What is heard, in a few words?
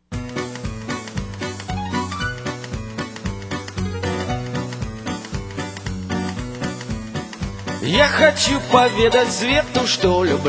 Morse Music